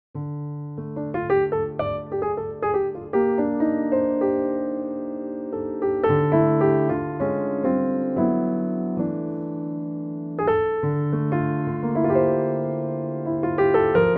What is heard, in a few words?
Ballad, pianoteq bechstein; pianoteq7